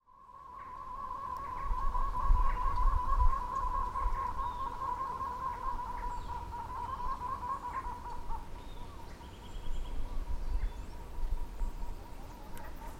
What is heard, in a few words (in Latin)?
semnornis frantzii